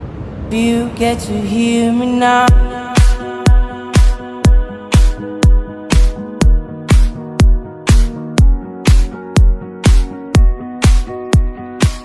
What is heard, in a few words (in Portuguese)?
Musica Fundo